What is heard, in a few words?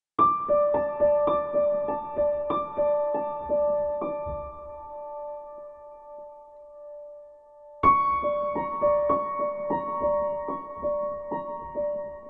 Cinematic Piano; tiny bunny full soundtrack 2019